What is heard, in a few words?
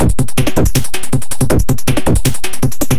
Beat, Industrial